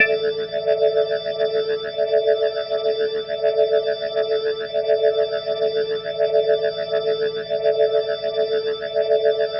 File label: Glowing Organ A Sus+8va; Ambient Cinematic; Organ Licks